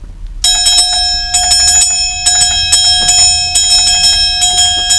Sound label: tram